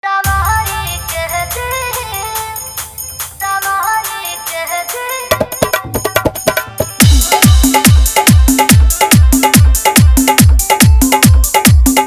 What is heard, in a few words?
Full Hard Dhollki Bass Mix